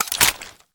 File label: Quad Open